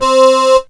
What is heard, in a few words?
Yamaha PSS-170 Accordion C4